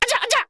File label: Rat Attack